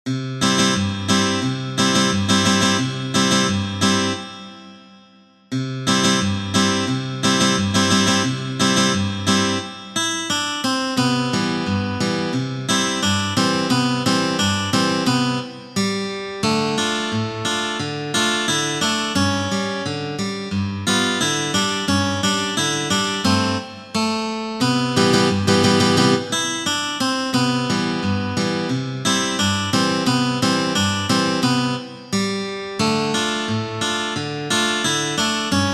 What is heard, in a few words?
synth